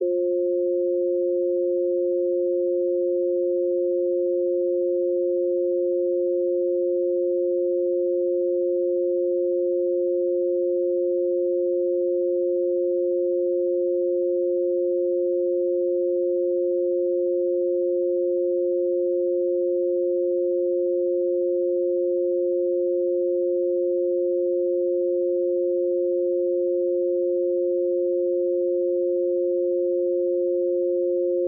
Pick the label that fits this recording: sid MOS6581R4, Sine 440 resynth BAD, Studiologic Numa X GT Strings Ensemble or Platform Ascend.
Sine 440 resynth BAD